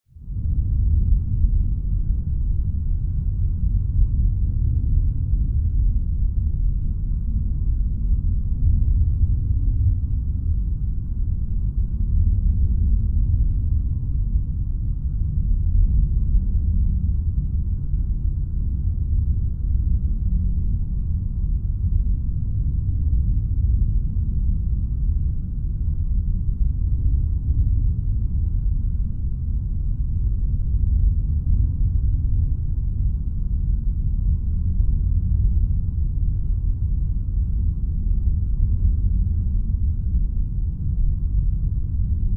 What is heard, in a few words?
Planetary Rumble, Ambience, Planetary, Rumble, Science Fiction; Deep, Space; 5.1; BlastWave